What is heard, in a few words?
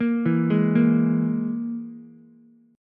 Gsharp Suspended 2nd Sharp 5th, Ukulele; arpeggiated; 3,4,4,1